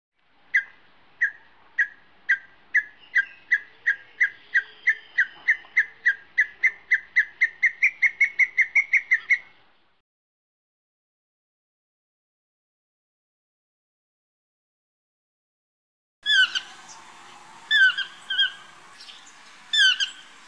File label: birds costa rica birds set